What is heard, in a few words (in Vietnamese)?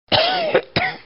am thanh tieng ho sac sua